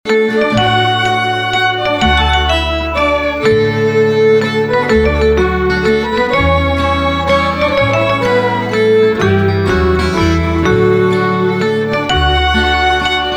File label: Waltz